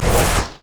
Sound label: basicattack; LoL; graves skin05